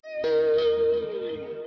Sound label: Casse Feedback Music; Puddle; puddle plus ios gamerip 2018